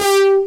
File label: analog stab; Pro-Rec - Synthsonic; TRINITY